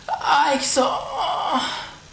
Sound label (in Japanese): アーイキソ… ォォォォォッ…